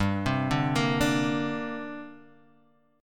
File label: D 7th Suspended 4th Sharp 5th, G